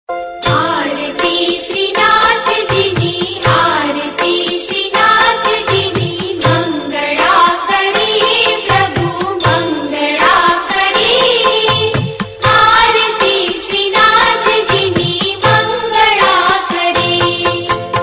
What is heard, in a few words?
aarti